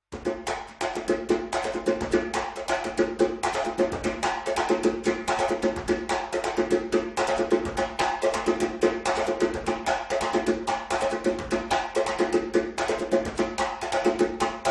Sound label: timbal